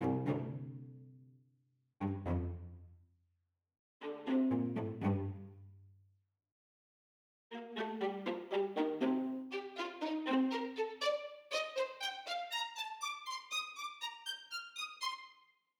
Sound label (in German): Spiccato-Streicher ohne Tverb, Nahmikrofonierung; String Ensemble; Eventide Tverb; Native Instruments Symphonie Series String Ensemble